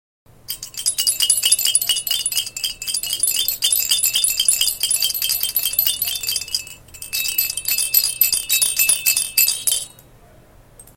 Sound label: Baby rattle for stuffed toy